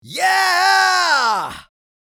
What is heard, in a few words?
Male yeah reaction